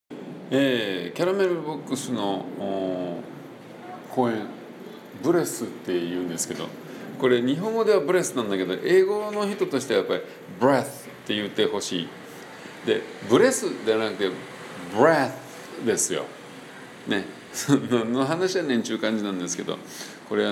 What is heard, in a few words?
breath